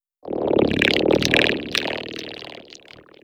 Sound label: fx synth